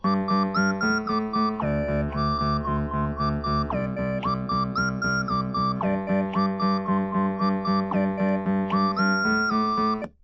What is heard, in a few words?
no drums, 130ms, 4cm